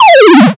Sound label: powerdown